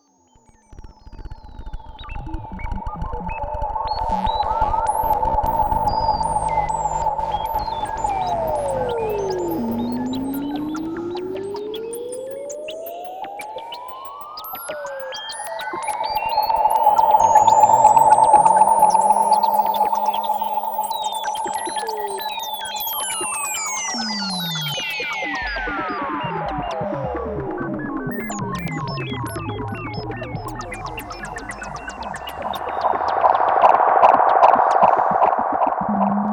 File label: Noises